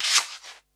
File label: shaker; dough-samples; eddyflux